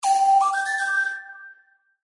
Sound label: chiff